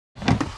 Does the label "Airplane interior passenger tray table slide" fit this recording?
Yes